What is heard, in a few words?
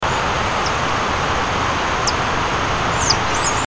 Spangled coquette